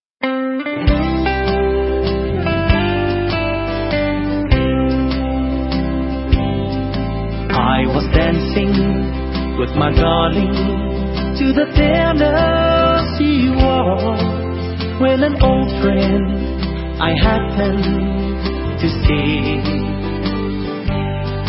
Waltz

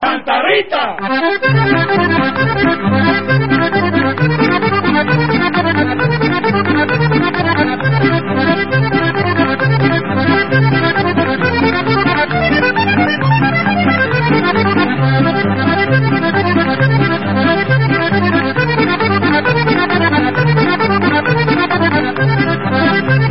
Mexican Instrumental

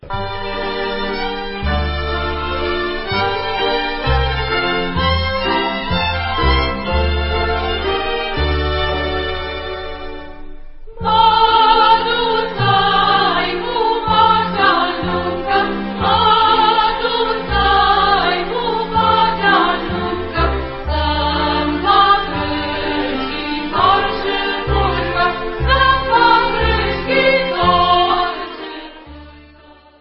Hora Din Campie Romanian Banat

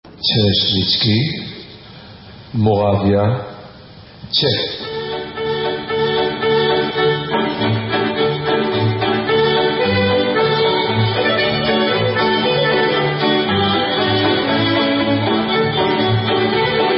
Czechoslovakian Instrumental; Ceresnicky

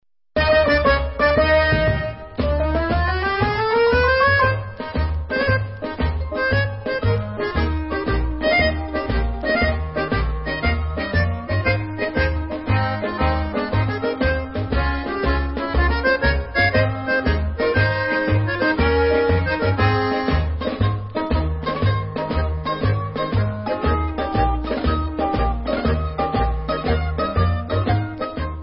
Instrumental

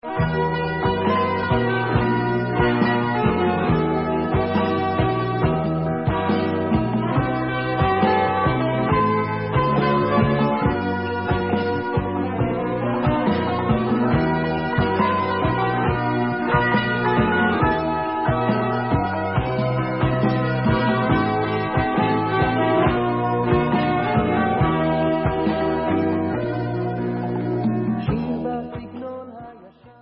Tango

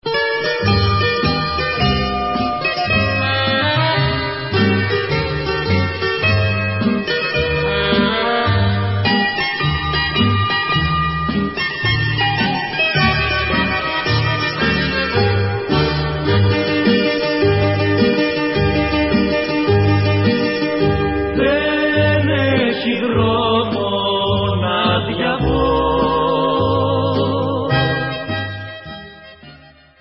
Hasapico

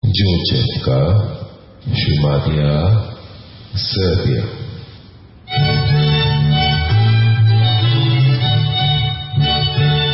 Djurdjevka, Serbian

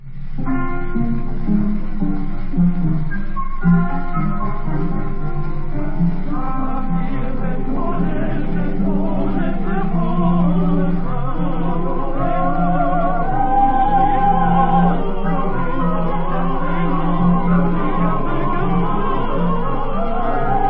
instrumental

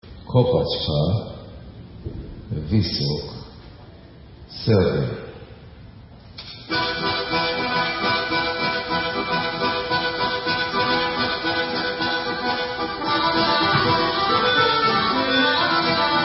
Kopacka, Serbian, Instrumental

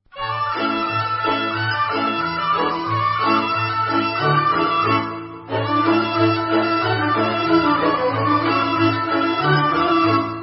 Horo Bulgarian

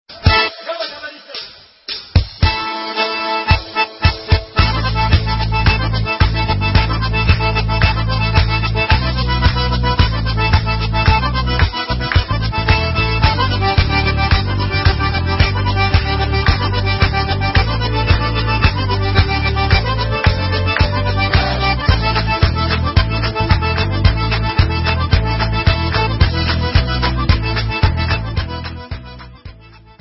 Debka, Instrumental; Debka Eilon

